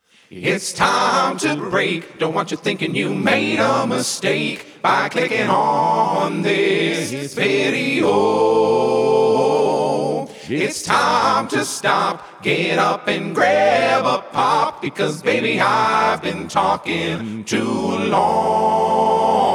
Intermission jingle

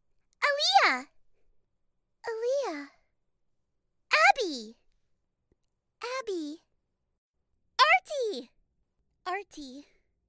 tam tones

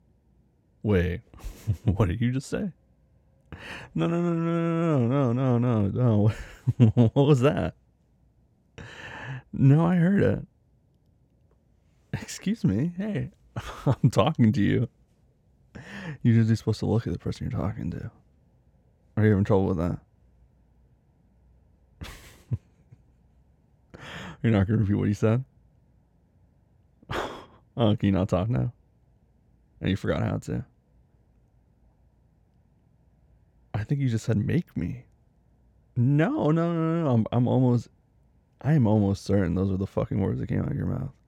sfx

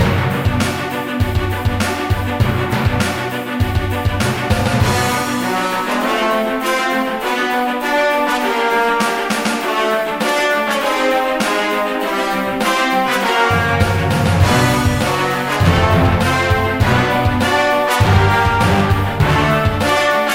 boss battle

